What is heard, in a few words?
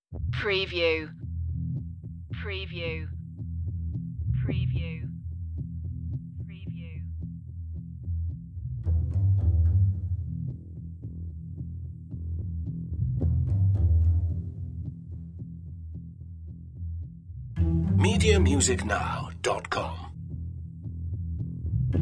gravity no choir